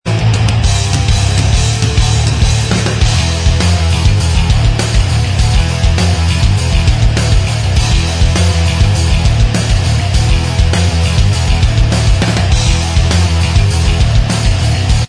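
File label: instrumental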